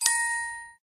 start; dinosaur run

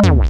fails; mannequin head

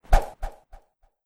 key fly; journey fox

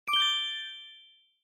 item click; bffs dark academia winter outfits

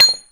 hit fx; blacksmith clicker

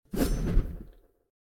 missed; fruit master

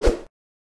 shot ok; right shot

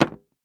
click; burger time

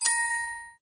start; dinosaur run

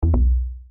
negative; hangman

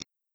click old; rabbit samurai 2

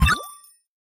pickup; 3d royale

